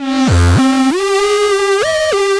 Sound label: Roland D Line, Roland S100; musicradar-synth-samples